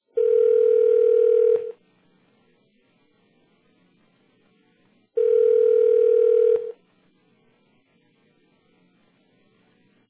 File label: flash ringing